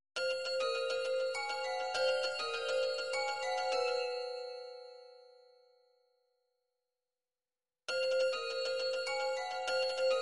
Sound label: midi drums